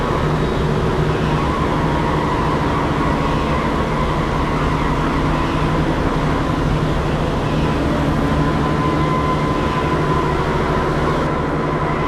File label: widow loop; Beacon; Titanfall 2